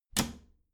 bathroom cabinet doors close regular; objekte; tueren

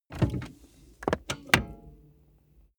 car bmw e46 interior seat adjustment down

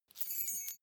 key ring large fly tinkle; objekte; schluessel

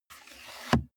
car bmw e46 interior sun visor mirror slide long

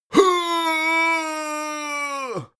male old attack; menschen; schreie

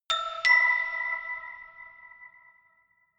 notification glockenspiel creepy fifth up

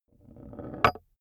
small metal rolling on wood stop; industrie wirtschaft; metall

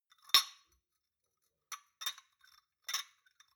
shot glasses handle, gastronomie; berufe; soundpool

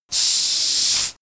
bottle lemonade fizz; berufe

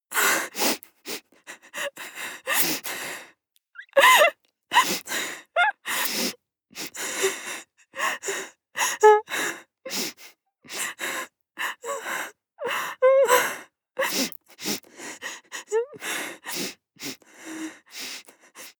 female whine; soundpool

